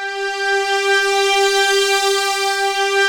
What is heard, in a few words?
Prophet 5, G5; Hollywood Edge - Giorgio Moroder Rare Synthesizer Collection